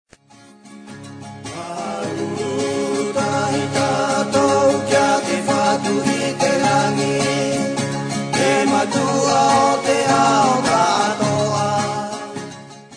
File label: muster tahiti